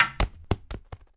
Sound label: Wood Pole Fall Pad High Jump; SFX Kit; Foley - Misc